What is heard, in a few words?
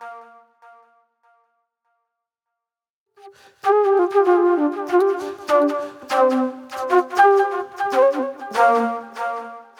Acid Trip Flute